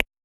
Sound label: soft touch, button